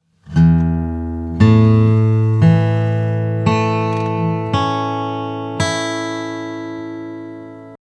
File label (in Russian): Слайд гитара